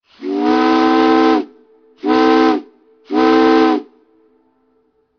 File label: Steam Ship Whistle